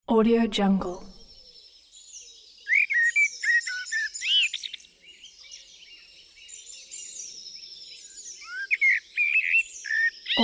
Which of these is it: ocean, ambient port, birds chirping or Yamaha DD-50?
birds chirping